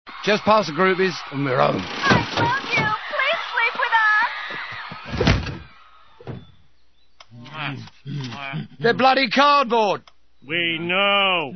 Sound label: strummer